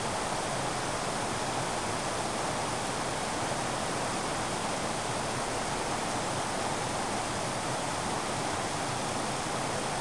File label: Ambience forest fountain